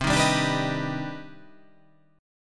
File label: C Minor Major 9th, chord